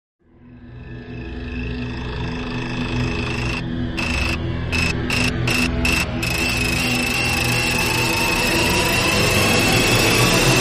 Dramatic Sting

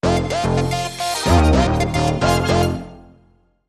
Sting No Drums

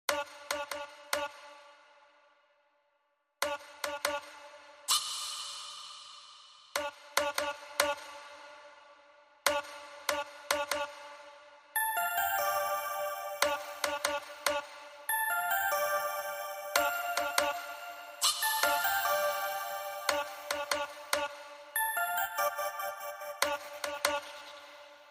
Vox Bells

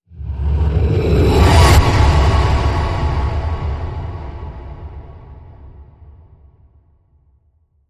Whoosh Of The Wild

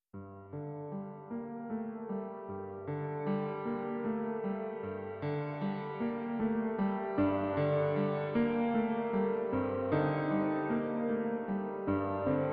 Verse Piano Only

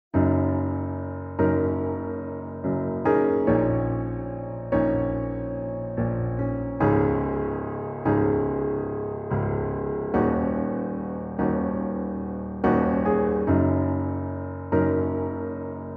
Simple Piano

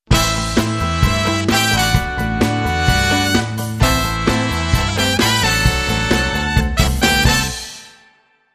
Rock Brass, Jingles

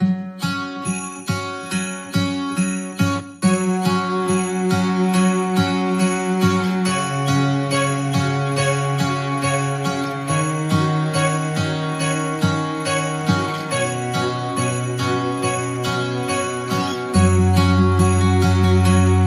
Joyful Acoustic Christmas Soft

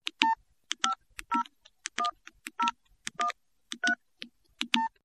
Dialing Beeps And Clicks

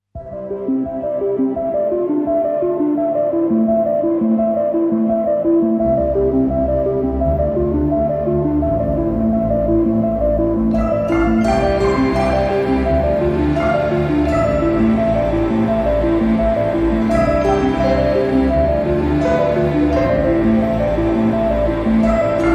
Quirky

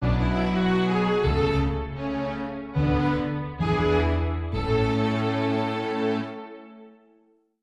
Oboe Concerto, Sting; Oboe Concerto 5

